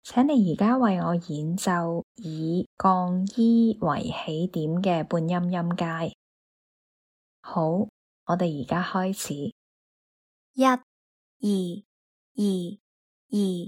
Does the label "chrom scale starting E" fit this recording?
Yes